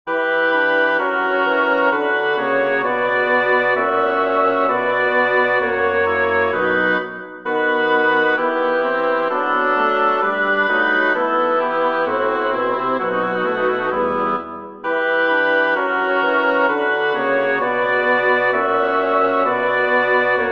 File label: chorus for double reed quartet; bach johann sebastian; wir essen und leben wohl